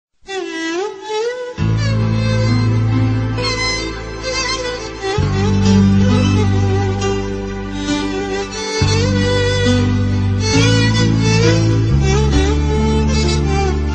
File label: arabic sad ringtone